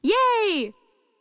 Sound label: gambler idle; Hot Party Deluxe; Wazdan